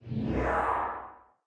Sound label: swish; smite; god thanatos